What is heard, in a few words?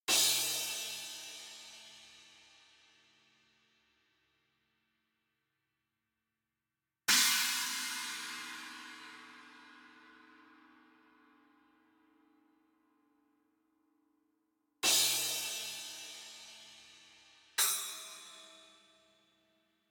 cymbals